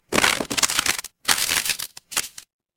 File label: paper fabric crumple